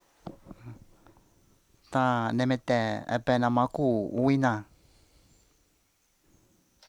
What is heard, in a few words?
child crying long